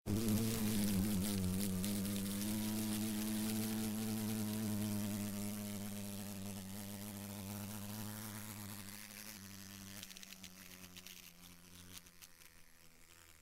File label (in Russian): Звук жужжание шершня